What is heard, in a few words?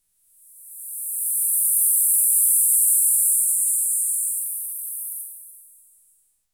soft chatter